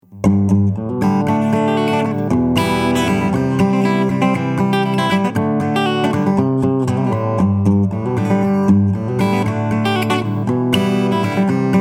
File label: Acoustic